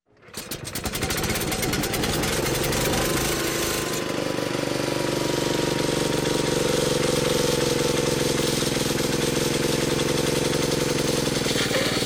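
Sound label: pump being switched on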